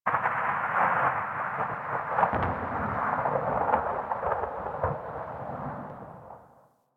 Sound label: Venus Landscape Distant Avalanche; Ambience; Warframe; Venus Open World